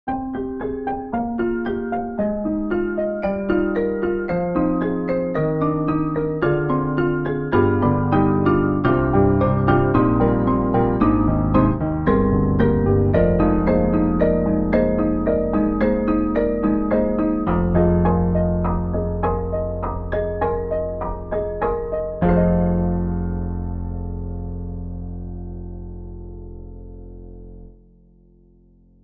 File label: Broken Piano Tiny Broken Piano